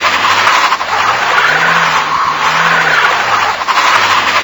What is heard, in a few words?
road scrub, Tires; Kart; AOS RF1 Kart Demo